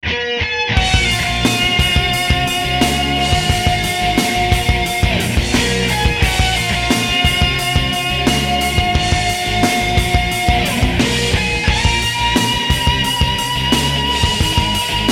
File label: Battle